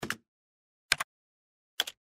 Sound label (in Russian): zvuk najatiya na klaviaturu